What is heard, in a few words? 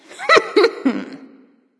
heh heh; cupid